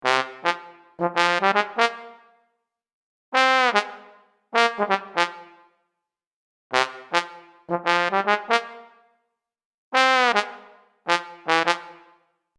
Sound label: Solo Bone; fantom-x8; patch demo